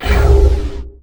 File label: Scifi Dome Shield Activate; Energy & Forcefields